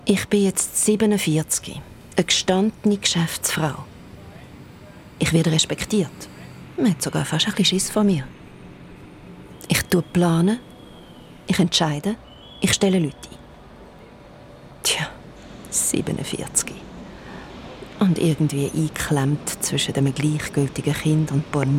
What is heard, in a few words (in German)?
UHR, schweizerdeutsch